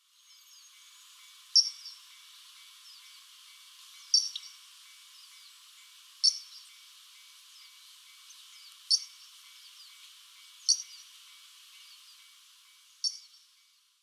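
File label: jobitaki, Daurian Redstart; kunitachi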